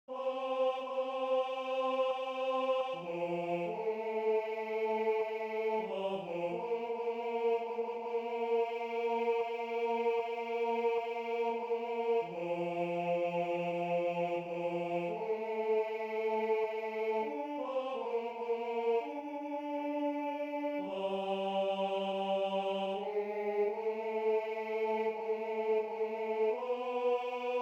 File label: Tenor